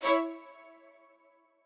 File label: strings